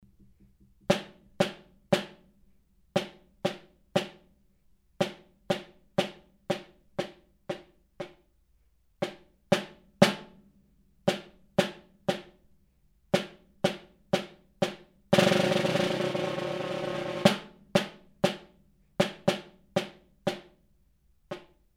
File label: snare